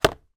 household plastic air freshener place down

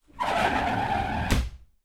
household shower cubical door slide

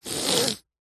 human sniff snot cold nasal close up short; zapsplat

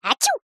cartoon character cute high pitched sneeze

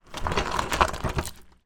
industrial sack barrow stop set down; zapsplat

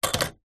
bbq tongs set down on wood; household; zapsplat

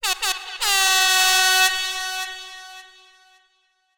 musical air horn dancehall style; sound effects one

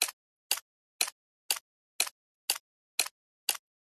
Rhythmical Movement Tick Loop

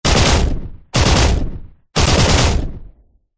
warfare machine gun powerful burst designed

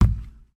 tyre fully inflated kick with boot; transportation; sound effects; sound effects one

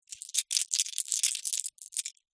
industrial metal ball bearings handful handling

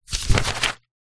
notepad page turn thin paper a4; foley

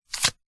money credit card remove from wallet; foley; zapsplat

